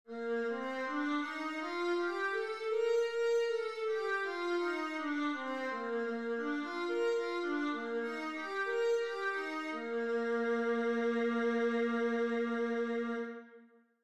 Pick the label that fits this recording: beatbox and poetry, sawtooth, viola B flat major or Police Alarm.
viola B flat major